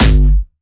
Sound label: Jumpstyle Kick G1, vengeance essential bassdrums; Jumpstyle Multis; vengeance essential club sounds-3; VEC3 Bassdrums